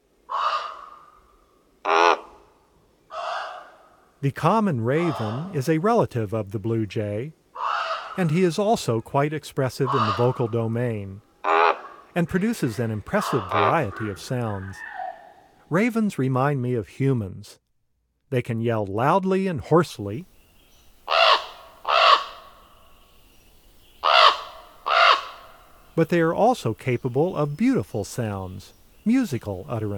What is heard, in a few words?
Common Raven, Bird Sounds; North America; Common Western Species; Know Your Bird Sounds